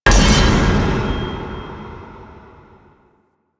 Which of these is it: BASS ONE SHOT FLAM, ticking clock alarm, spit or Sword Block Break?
Sword Block Break